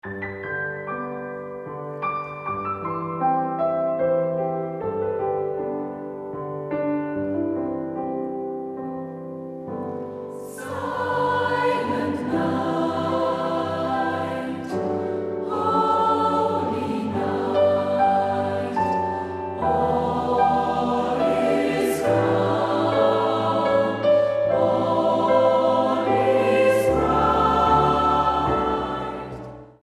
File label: silent night, weihnachten